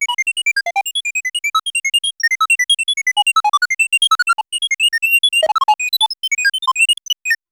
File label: a rapid series of bleep sounds in quick succession